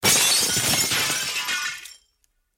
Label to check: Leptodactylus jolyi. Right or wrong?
Wrong